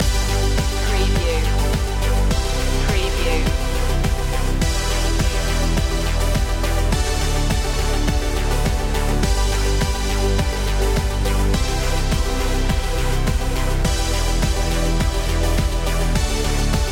sparkle loop

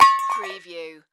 Ash Tray Set Down; sound effects bible

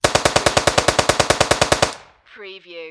Submachine Gun Sterling

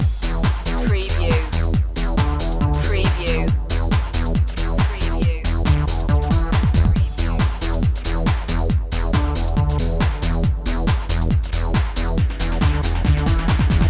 accelerator loop

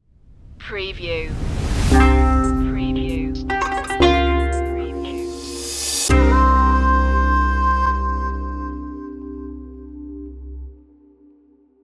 desert market stinger

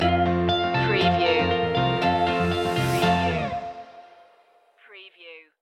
full sails stinger

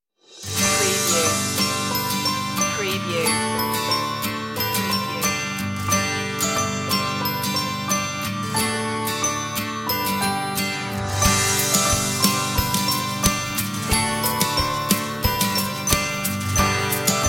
instr

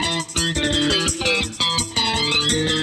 surf loop

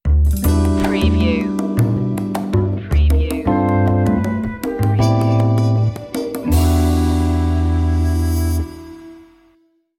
jazzy opener

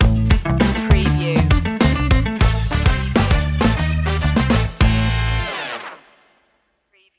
funk stinger

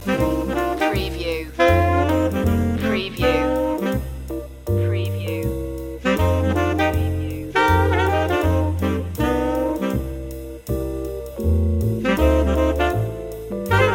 blues loop

